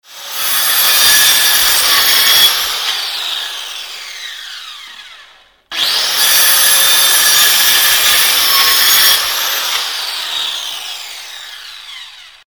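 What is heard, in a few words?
sounds of angle grinder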